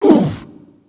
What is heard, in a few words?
"oof"; N64; DRMARIO64; vampirewario